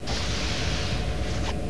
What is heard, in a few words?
TURB DOOR